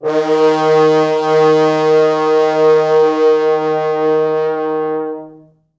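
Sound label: 4 French Horns sustain; D#2; East West; Quantum Leap Horns Sax; 4 FrHorns SUS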